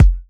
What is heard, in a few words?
Kick, Kickdrum; Equipped Music; Loungin House